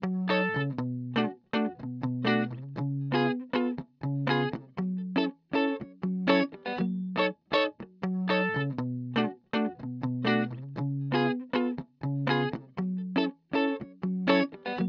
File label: Elec Gtr